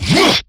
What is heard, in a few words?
Melee Attack; New War; Erra